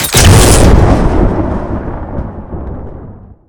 lightning bolt

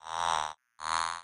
moth Death Gasp

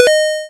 ping; civ13; civ14; Effects Cargo

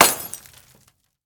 glass break

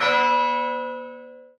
church bell

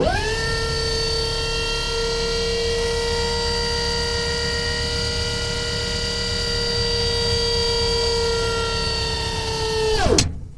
hydraulic press

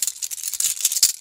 cuff takeoff end, handcuffs

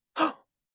man gasp

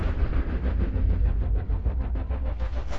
alien teleport

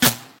bullet miss; Guns

